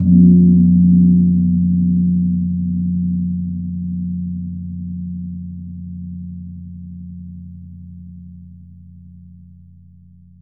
GONG F2; USB Soundscan vol.60; Asian Traditions; AKAI

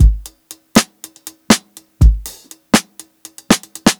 120 HALF TIME, DRUMS LOOPS; BASEMENT DRUMS VOL 2

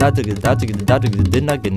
INDIA R, IND ENS PH, Heart of Asia

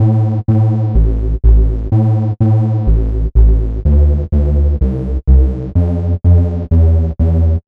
Bass, Afterhours EDM, Electro Shock; Sonic Foundry (Sony Creative Software)

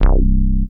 BASS Bass; Familie Papegaai; Polyend; Legowelt

